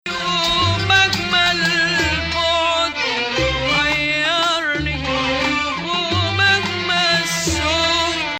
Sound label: Bayati Nahawand, maqam